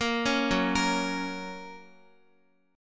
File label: Gb Major Gb Guitar; Gb arpeggiated; JGuitar